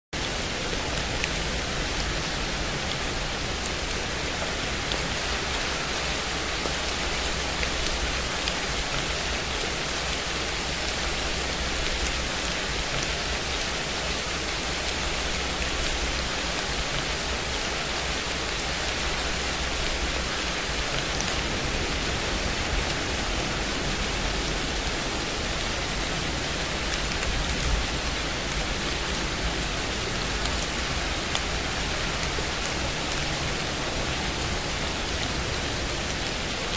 Relaxing Music For Sleep - Rain Ambiance for Relaxing